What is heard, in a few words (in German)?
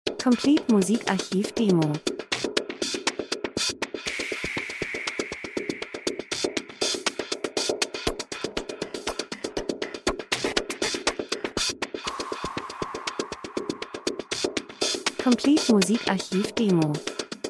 drums, rhythmus; Elektro Drums und Percussion gleichförmig geheimnisvoll Erwartung Sounddesign Reisefilm Natur Dokumentation